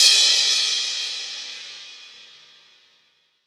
large room crash sound c sharp key

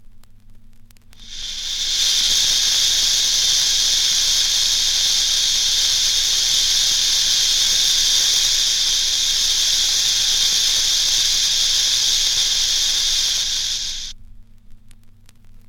snake hissing

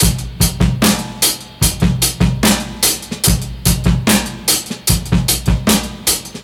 75 bpm drum groove A key; drum loop

